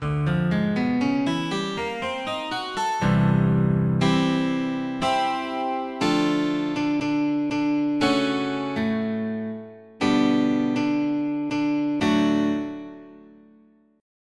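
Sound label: Single Bend Up, twelve string guitar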